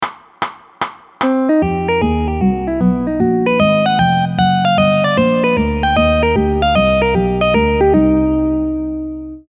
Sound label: triad